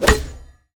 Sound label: spiders heroe melee